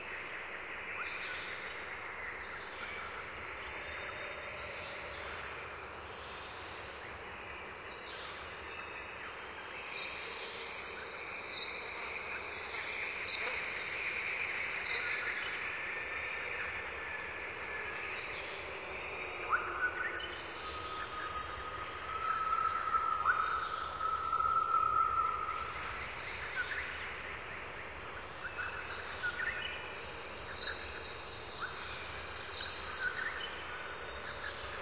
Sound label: amb lp